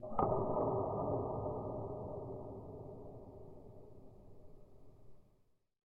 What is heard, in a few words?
dungeon echo wet; amb; angband